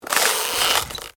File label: Ice: creak of ice under pressure; Snow; Weather; Stuart Duffield